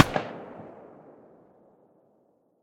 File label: mdr silencer mono far, weapons